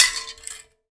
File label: Finger Hit, Prepared Kit; Battery 3 Sample Kits